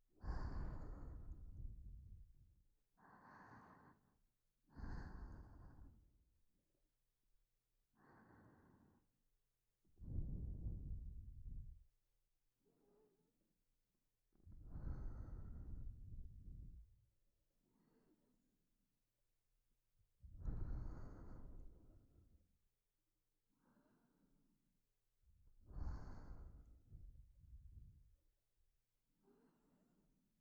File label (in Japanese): 寝息, ASMR